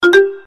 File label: New Ping Tone